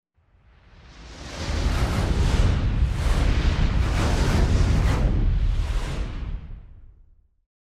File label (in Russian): proletajuschij kosmicheskij korabl